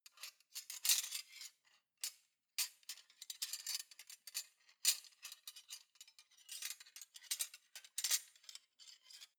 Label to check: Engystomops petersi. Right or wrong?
Wrong